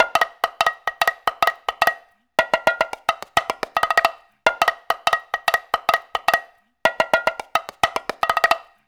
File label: AFRO, African Contemporary, AfroTriangle; Spectrasonics; Supreme Beats